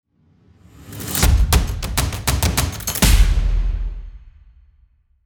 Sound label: starting